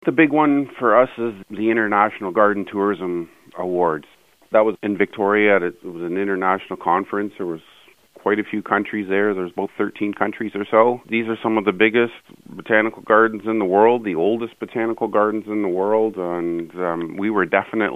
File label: whistling